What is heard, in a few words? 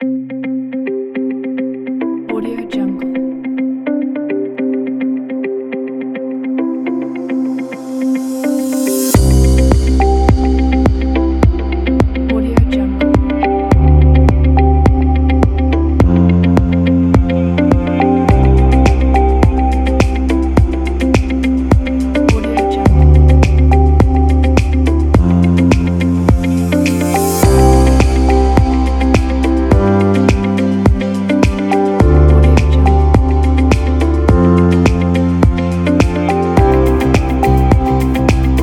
bright presentation corporate